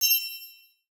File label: BUS STOP BELL; SIMUZENSOUND